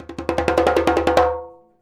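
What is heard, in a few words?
100 JEMB, djembe; Univers Sons; Basicussions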